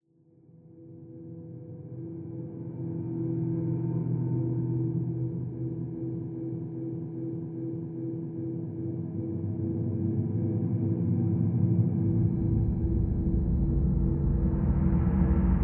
Subway Gas Mask Explore Mood

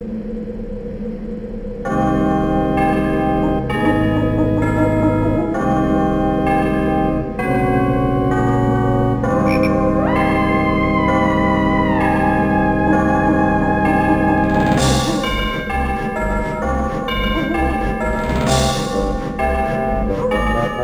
Church Bells; Banjo-Kazooie; Mad Monster Mansion

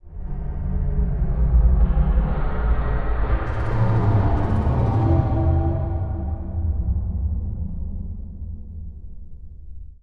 Scary; Dark Dimensions: City of Fog

